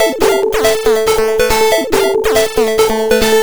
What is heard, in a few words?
140 Synth A, 140 BPM; loops; CHIPSHOP DELUXE